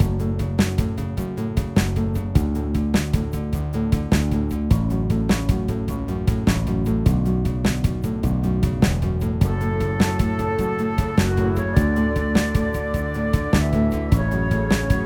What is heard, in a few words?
Mystical World Battle